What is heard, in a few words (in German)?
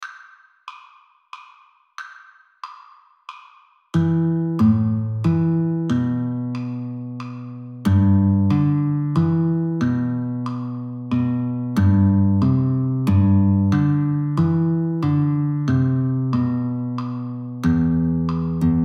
Hasellwoods Galliard Gitarre